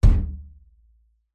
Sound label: impact metal hollow thump side washing machine